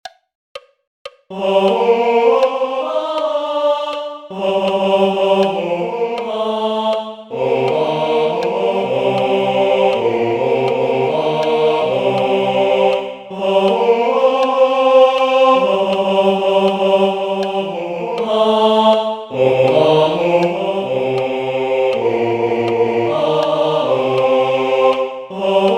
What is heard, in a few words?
Tenor; LA CHORALE ANARCHISTE